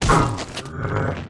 BLAZE BLOCK WAR DAMAGE

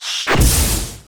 Qurian Attack

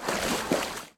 Water Walk

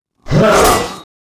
war attack; Mob

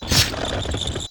Tower war damage; LazhuilTower; Mob

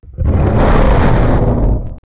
peace idle; DAGAN; Mob

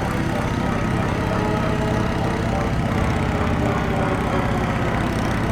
Mining; Weapon

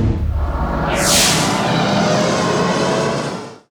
increase speed

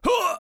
male damage critical

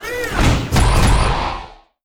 catapult combat attack

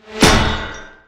Arrow Metal, Damage

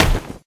Mace cloth, Damage

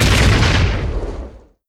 Death Blow

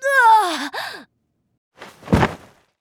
High elf Archmage Die

